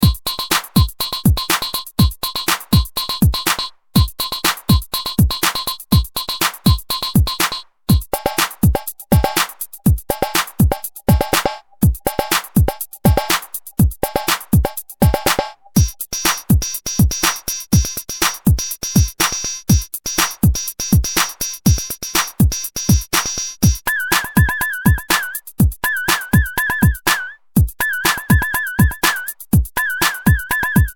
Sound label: Perc